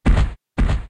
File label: walk